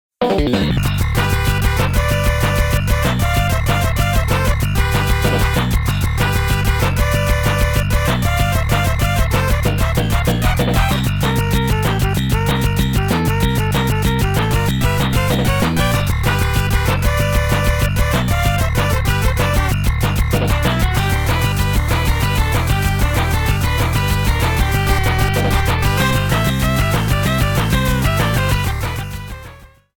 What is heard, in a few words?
Music Battle; MKSC